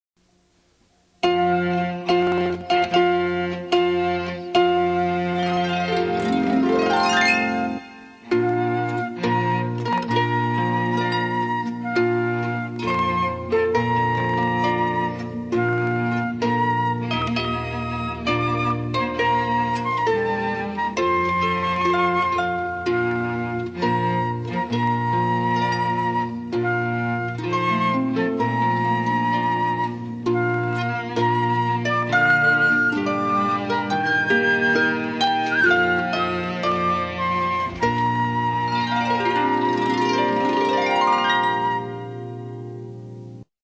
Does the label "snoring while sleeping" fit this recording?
No